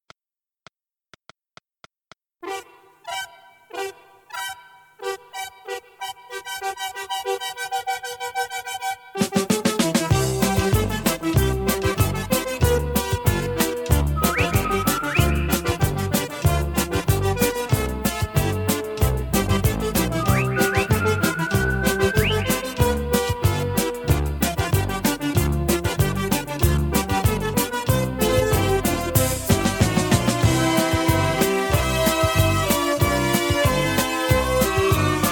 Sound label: Party Song; backing track